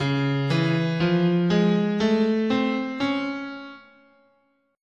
C sharp Augmented, scale

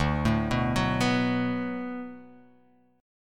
D 6th D down, chord

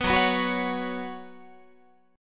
Gb Suspended 4th Gb, down, Guitar; strummed

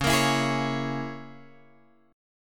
C sharp Minor 6th, Guitar, Drop D; 11,13,14,13,14,x

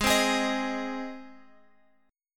G sharp Major G sharp Guitar Open G, chord

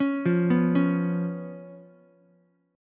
B Suspended 2nd Flat 5th B down Ukulele; arpeggiated; JGuitar

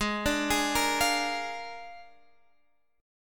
A sharp 7th G sharp

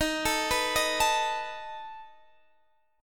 A Suspended 2nd Flat 5th Eb, guitar

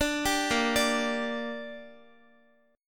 A sharp 6th, Guitar; A#6; arpeggiated; x,x,12,12,10,9

